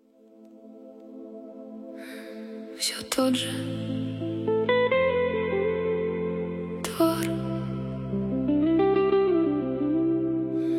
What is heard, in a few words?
Vinyl Rain Mix